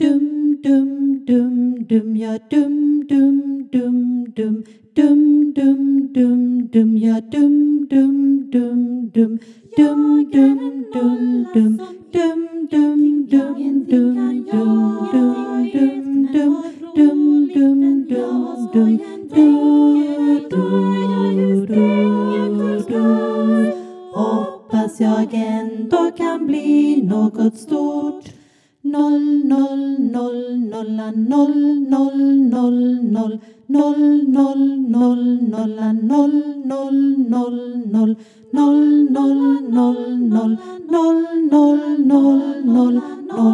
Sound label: Baritone; Nollevisan